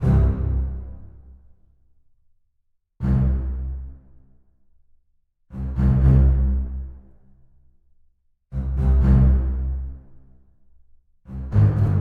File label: Bass String